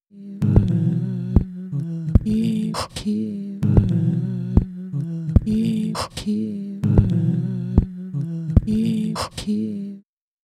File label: loop; puredata; ALFALOOP